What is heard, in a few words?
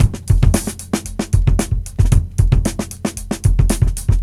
Breakbeats